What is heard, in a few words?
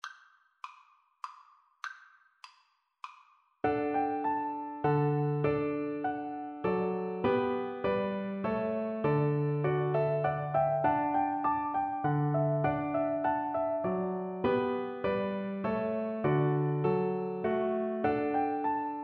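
minuet g VLC karaoke, Cello; Telemann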